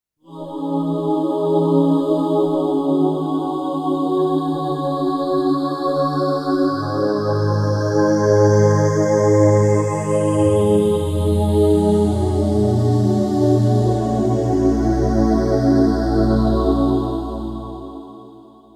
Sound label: Space Aah